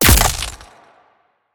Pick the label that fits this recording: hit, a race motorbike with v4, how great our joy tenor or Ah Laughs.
hit